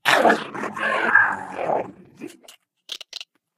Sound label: ballbot smash into wall